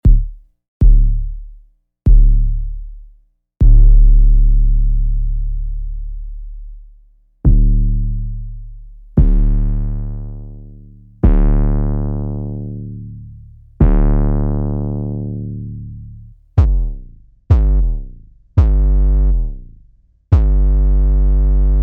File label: 808 moog bass